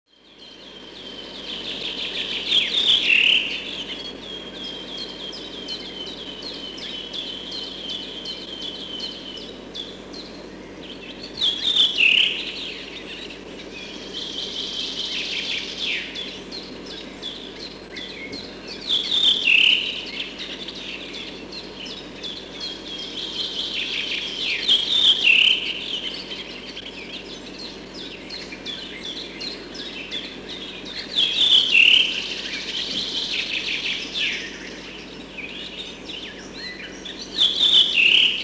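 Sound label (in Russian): drozd belobrovik, redwing thrush; vesna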